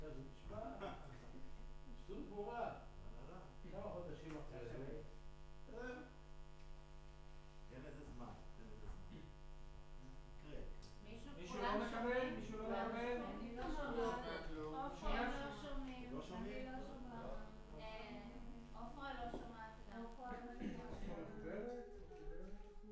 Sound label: Room, Choir